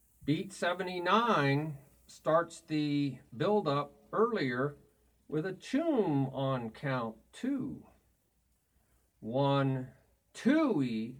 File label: Beat 1 2 e a 3 e a 4 e a Toom Choom Ba Ba Toom Ba Ba Cha Ba Ba